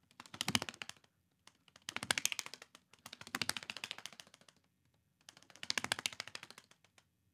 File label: Flapper